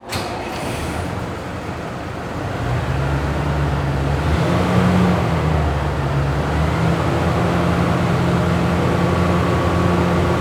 Transportation Truck Fire Start Up Idle Rev Shut Off Interior Fire House Station Garage; Doors Open